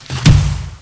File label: Chaindoor Down; Playable Tutorial Level; TR1